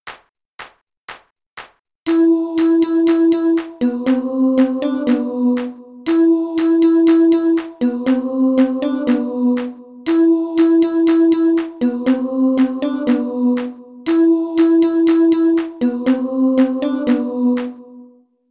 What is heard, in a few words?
boucle